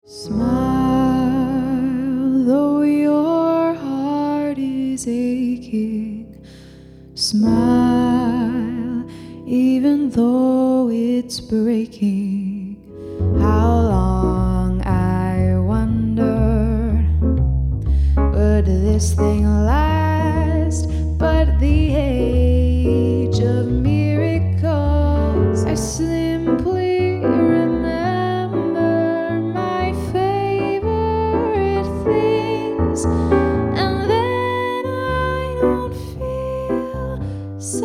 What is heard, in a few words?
Jazz Duo